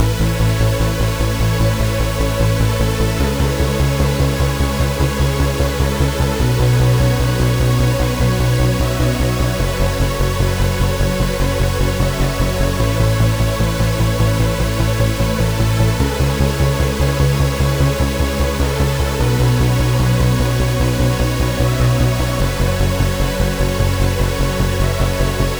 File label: Drop Loop 150 C#; embers; Phoenix